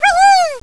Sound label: wb attack, monster wombat; wizardwars